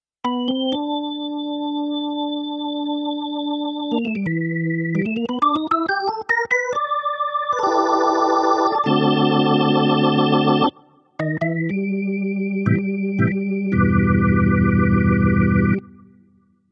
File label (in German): Electro 6 B3 Orgel